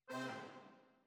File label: Horns